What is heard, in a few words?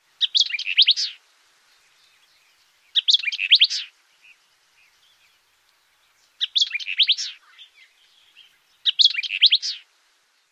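white-eyed vireo song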